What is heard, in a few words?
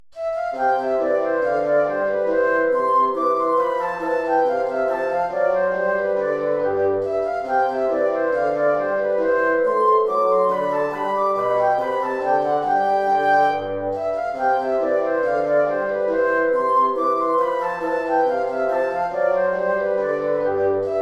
hornpipe; hook james